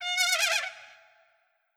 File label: Trumpet